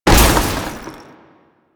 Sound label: Common Military Crate Destroy; Objects; Warframe; Storage Container